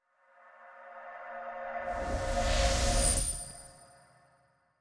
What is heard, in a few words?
item showcase coin; cs go